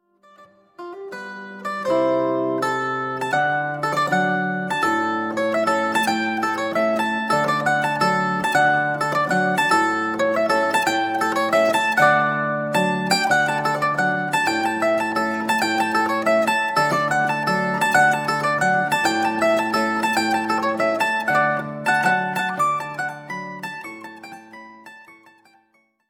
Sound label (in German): innviertler landler